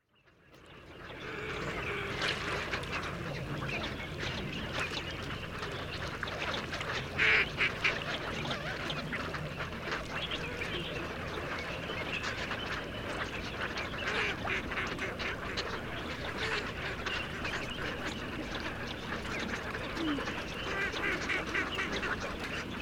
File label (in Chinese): 鰲鼓鴨群